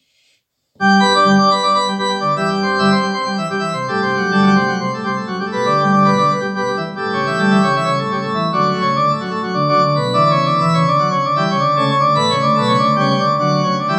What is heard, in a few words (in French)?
Prélude Bach pour orgue; Pour dimanche Pâques résurrection